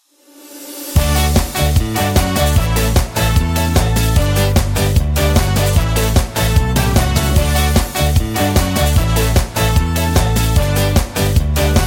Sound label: ska